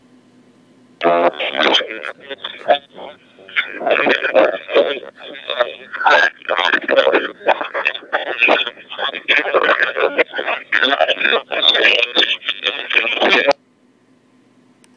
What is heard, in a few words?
DMR 128 Enc